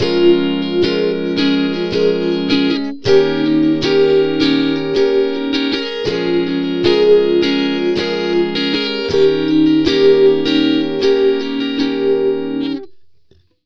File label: Rytm Gtr A#, Slo Mo Funk, Lounge; Zero-G Kocktail Kollection; Construction Kitz (Lounge)